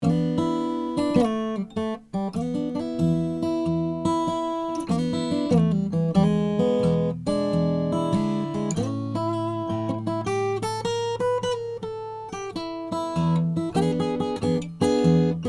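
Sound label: mcpherson mg 3 5 brazilian rosewood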